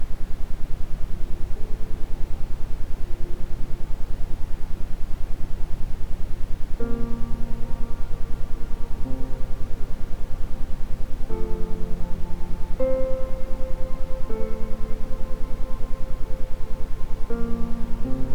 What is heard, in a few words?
isochronic, Ambient Sound; Gehirn Gehirnleistung; isochrone toene